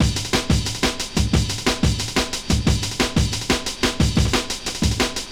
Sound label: Funk Break, Drum & Bass; Fluid Dynamics